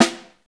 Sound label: SN1PP, orchestra hits; Best Service; Peter Siedlaczek's Advanced Orchestra